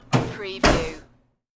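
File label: Open Broiler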